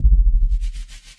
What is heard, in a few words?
Perc, drums; Touch Loops; BlissedOutBeats